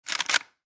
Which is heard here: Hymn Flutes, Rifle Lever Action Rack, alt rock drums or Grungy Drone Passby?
Rifle Lever Action Rack